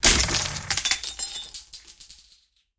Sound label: Window Crash; Game rip; MiSide